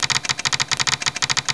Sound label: KEYSTROKE